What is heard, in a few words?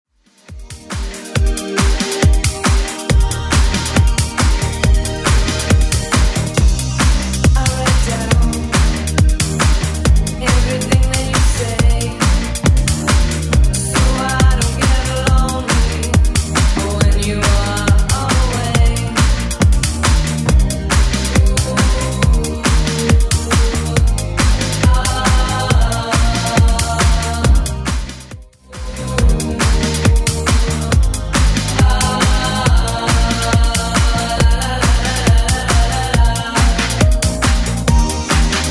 80s Club 138 bpm; remixplanet